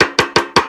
perc knock, Drums, One Shots; Percs; Slate; SD AURORA Sample Pack

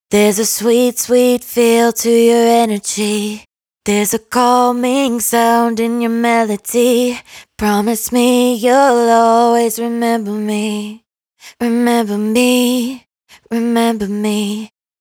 hook 128 Bm, Dry Vocals; Raw Vocals; Energy; Slate Digital Omnivox Vocal Sample Pack

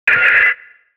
fx oneshot; Pop; Drums; Slate; SD PASADENA Pop Sample Pack

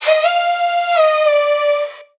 vocal spark Bb; SD AURORA Sample Pack; Slate

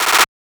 clap roll; Drum One Shots; SD MIDNIGHT Sample Pack; Slate; Claps

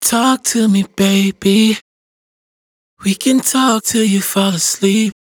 hook 138 F, Dry Vocals; Raw Vocals; Talk to me; Slate Digital Omnivox Vocal Sample Pack

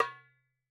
darabuka Single hit; Raw Perc One Shots; Slate; SD SEISMIC Drum Sample Pack

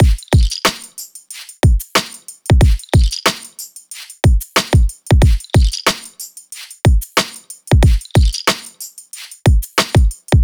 drum loop 92, Pop; Drums; Full Drum Loops; Slate; SD PASADENA Pop Sample Pack

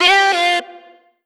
Omnivox oneshot F, Vocal; Slate Digital Omnivox Vocal Sample Pack

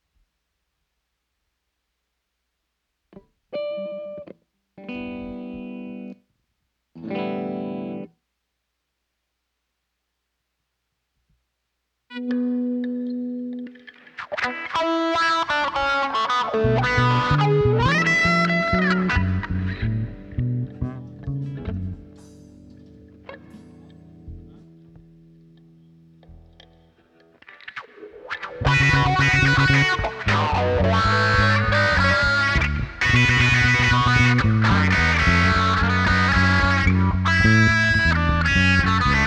Strings

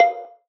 tonal oneshot F; Pop; Slate; PASADENA Pop Sample Pack; Tonal One Shots

